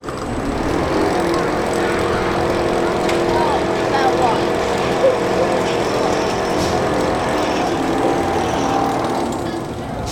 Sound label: leisure fairground ride rollercoaster climb drop; dragons fury